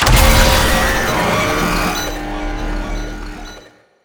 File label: bound hit first